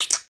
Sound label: Bug